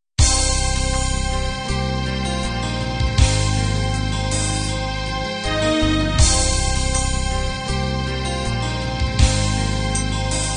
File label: guitar ballad end